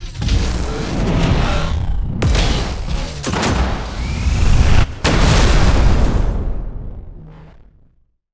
Buck Shot; starbites

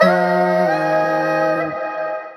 cute coro; Clawberta

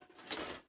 Mech Footstep Texture Movement; starbites

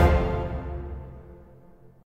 Battle

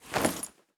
Inventory Open

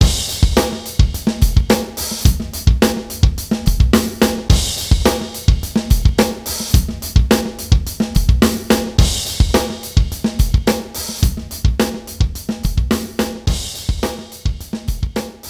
Music Frontend

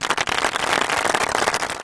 Finish win

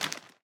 Footstep Fertilizer

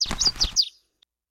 Obstacle Bird End; In Obstacle Bird End; Hello Kitty Friends Match; Android, iOS, Mobile

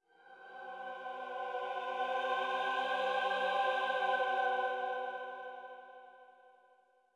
Choir

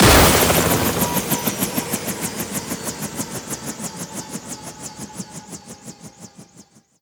Enemy Swat Helicopter Attacked; gamerip

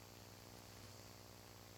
Electric-Hum Surge

